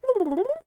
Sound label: turkey question